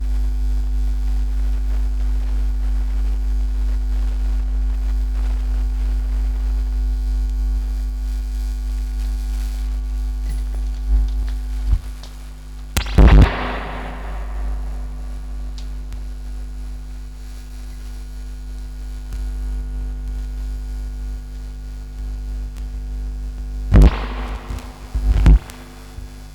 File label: Feedback Vocoder; RodeNt4; Vinyl Mix